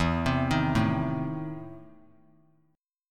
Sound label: E Major 7th Suspended 4th Sharp 5th; EM7sus4#5 arpeggiated